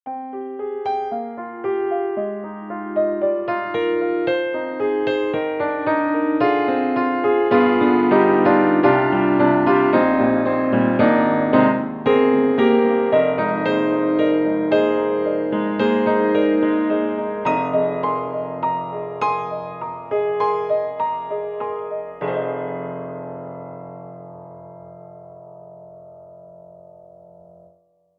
Vertikal Jazz Brite Space; VI ONE

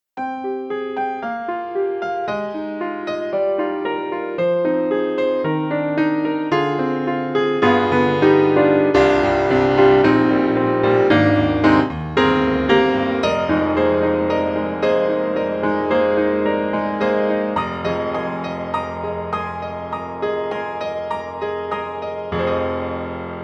Pearl concert grand, on stage; IMPACT SOUNDWORKS

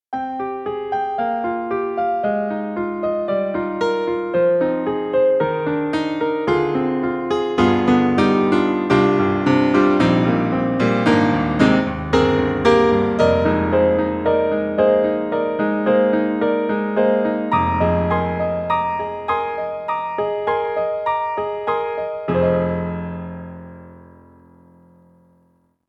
EDIROL SD-20, Rock Piano

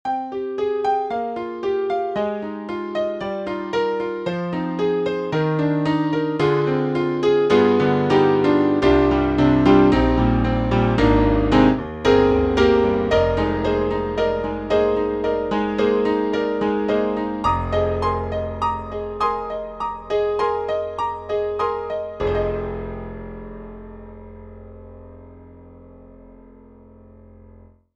Bright Acoustic Piano; Basic

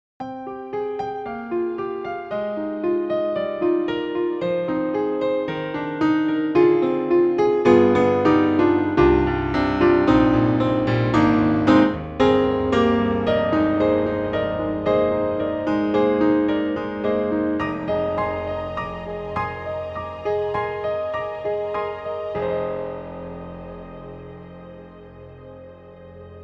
Italian Grand and String Pad; Synthogy; IvoryII

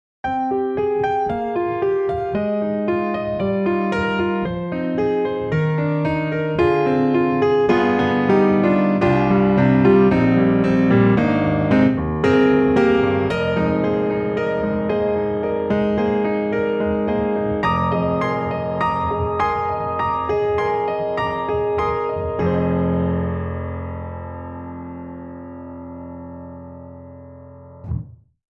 Grand Rhapsody Piano Sentimental Grand; Waves

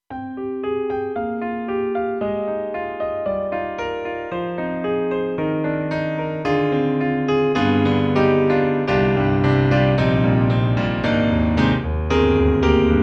New Harmonic Grand Piano; KORG M3 XPanded Program

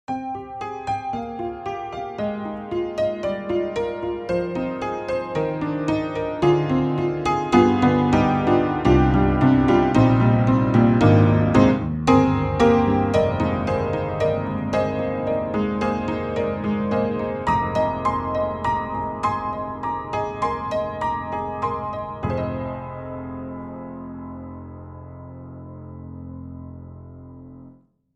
Lush Barroom Upright; Upright Pianos; Synthogy; Ivory II

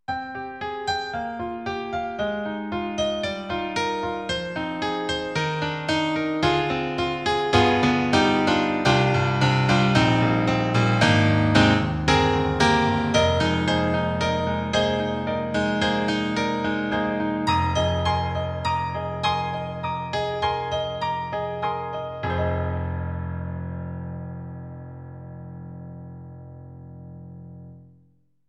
SD-90 Enhanced Piano; EDIROL; Enh.Piano 2

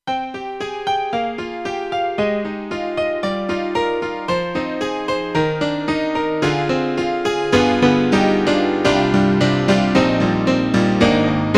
KORG X3R Honky Tonk

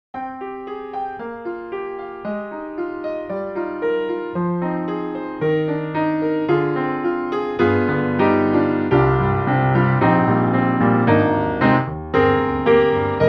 Neumann Head; Soniccouture; The Hammersmith Professional Edition

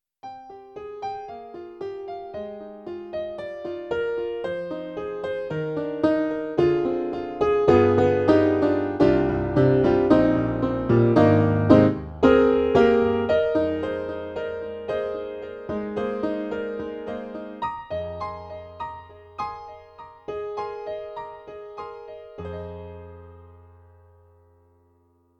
E-MU Holy Grail Expansion ROM R and B

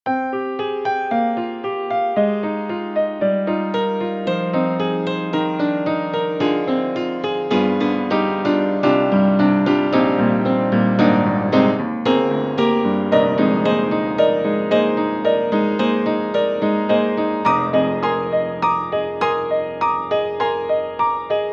Upright Piano Close Walkin' Line; Steinberg; The Grand 3

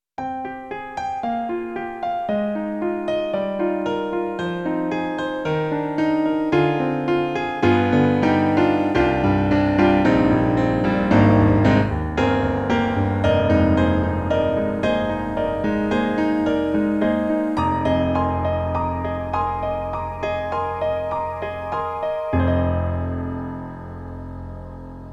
Roland SonicCell Grand+Vox

